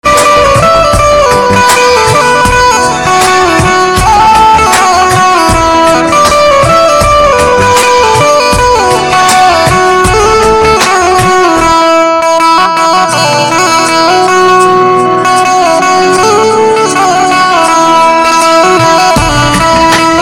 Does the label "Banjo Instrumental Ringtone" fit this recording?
Yes